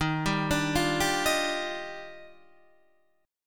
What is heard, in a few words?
Eb Major 9th, Guitar DADGAD